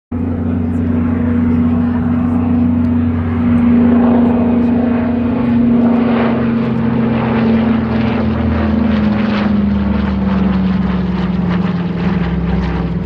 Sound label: Douglas DC-3; هواپیما داگلاس دی سی تری